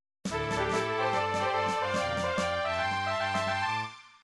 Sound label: fanfare; super nintendo chrono trigger